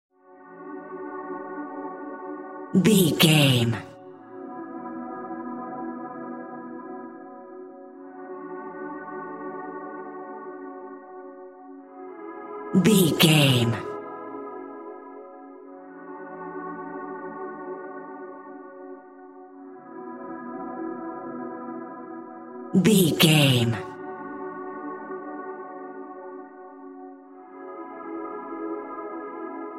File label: slow eerie ambient pads